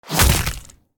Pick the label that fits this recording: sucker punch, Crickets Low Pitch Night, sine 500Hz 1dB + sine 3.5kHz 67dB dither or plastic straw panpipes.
sucker punch